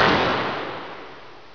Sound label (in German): PISTOLE